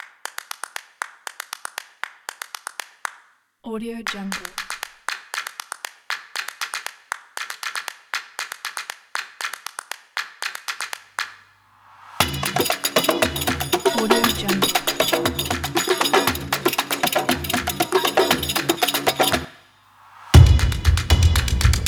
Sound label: jungle hunt